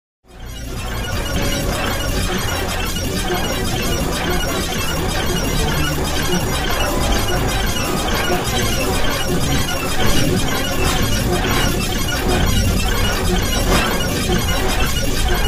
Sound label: computer noise; radio signals